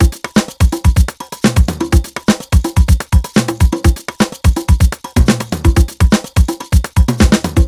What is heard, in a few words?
busy, Beats, Nu Jazz House, 125; PowerFX